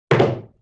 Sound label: Wood Hit; Vintage Cartoon; Drums & Percussion